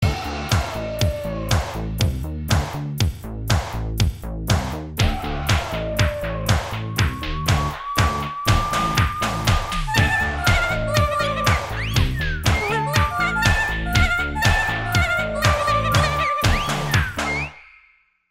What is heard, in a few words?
Carne Beat